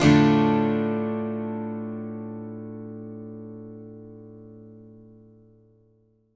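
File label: Acoustic guitar D minor up, Country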